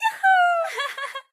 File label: win level; louise